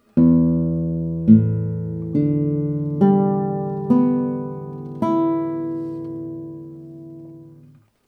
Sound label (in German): Saiten Schalloch, Konzertgitarre